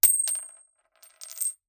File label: Coin Drop Concrete Floor